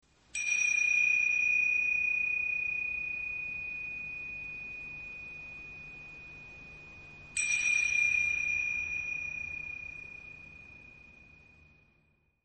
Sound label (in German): Zymbeln